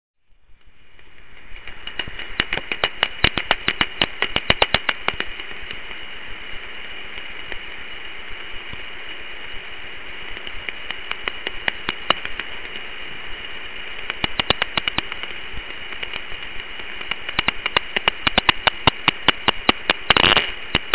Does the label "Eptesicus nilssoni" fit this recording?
Yes